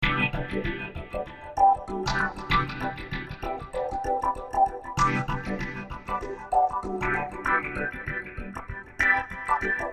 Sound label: Swinglefunk C